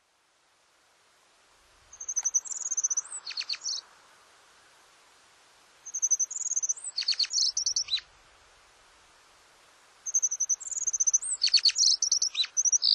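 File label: Japanese Accentor; kayakuguri; norikura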